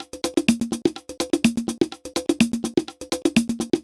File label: HH Perc Loop